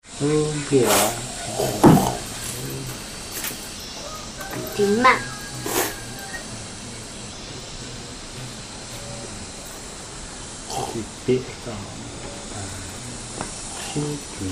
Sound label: Dinner At Gers House, Sa Pa Vietnam